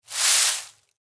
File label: Hay Movement; Farms; Foley; Hay Object; Blastwave